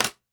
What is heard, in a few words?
click, ui